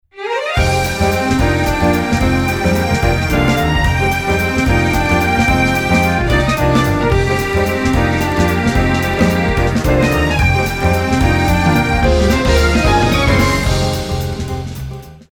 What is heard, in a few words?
Jazz Waltz Strings